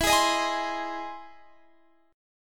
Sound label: E Augmented Major 7th E down Guitar Standard; E+M7; strummed; capo=2